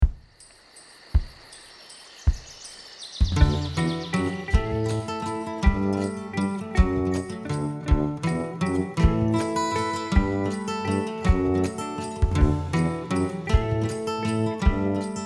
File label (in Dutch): kleuterlied instrumentaal